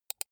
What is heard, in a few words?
computer mouse right click soft fast